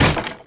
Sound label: stumble; synth player